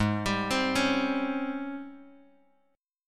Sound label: G sharp Major 11th G sharp; G#M11 Chord {4 X 5 5 2 X} arpeggiated